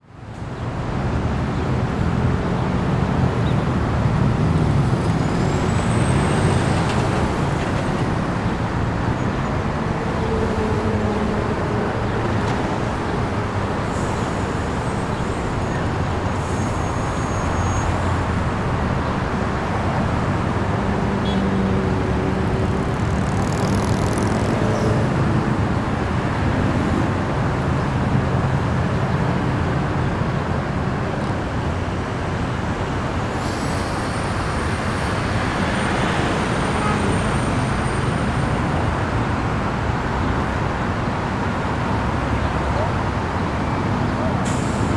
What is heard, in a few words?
City Downtown; Blastwave FX